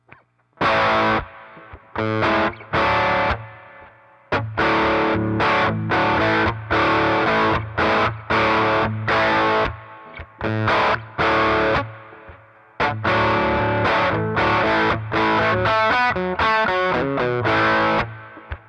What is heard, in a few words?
Low Gain Classic Rock with cutting mids and subtle delay; Velvet sledghammer; Yamaha SG into 6v6; Velvet Sledgehammer